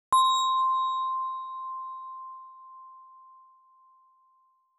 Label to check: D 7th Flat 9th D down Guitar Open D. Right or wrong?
Wrong